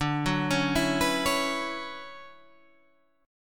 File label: D Major 13th D down Guitar Standard